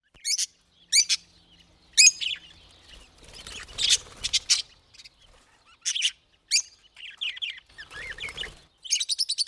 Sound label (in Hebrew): צילצול ציפורים